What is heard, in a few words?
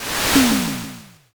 fire magic